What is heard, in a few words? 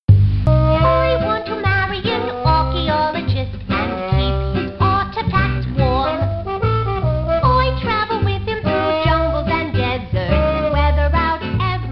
archeologist vocal; LB2